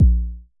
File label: Basic Kick A#1, Dirty Kicks, Vermona Kick Lancet; LookIMadeAThing Kicks